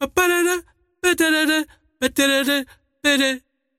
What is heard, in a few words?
singing; dota; largo